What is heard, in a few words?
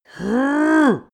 male exert; menschen; koerpergeraeusche; soundpool